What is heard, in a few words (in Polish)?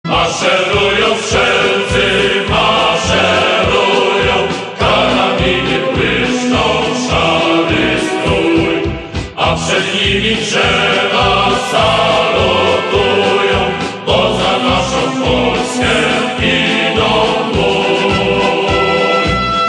refren